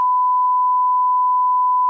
censor beep

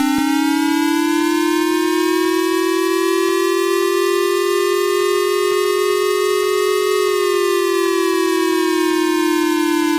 missile alert

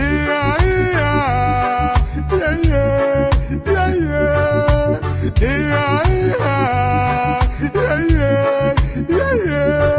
ia ia ahh yeye yeye lovely sad

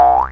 boing

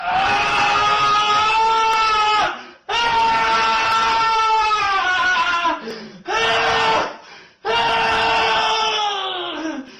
haaaaa

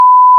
censor beep; pvz game over sound

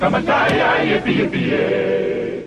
yippie ya ya yippie yippie yeah; hornbach

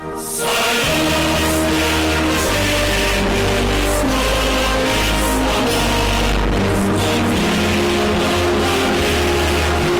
anthem bass boosted; soviet

